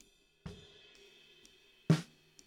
SNR Top

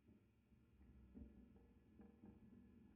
GTR Royer

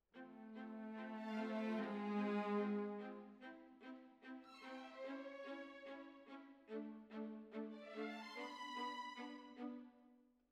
Cellos Over

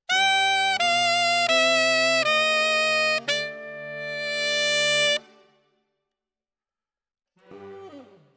Alto, RNR for piano

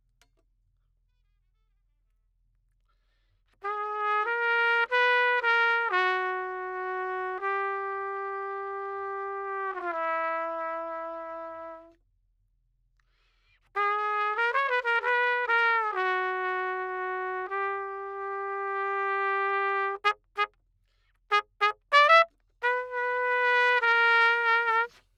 Trumpet

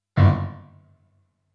Piano, RNR for piano

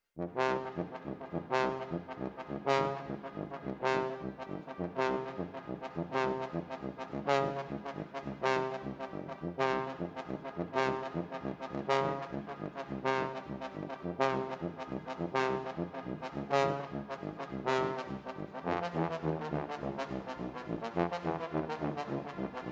Tromb ASS

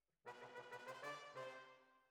Horns

